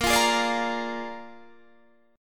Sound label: A sharp 7th A sharp, Guitar